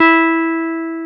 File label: Jazz Guitar